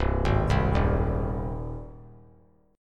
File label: C Minor Sharp 5th C 3C,3F,3A,3D; arpeggiated; JGuitar